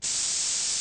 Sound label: spray first aid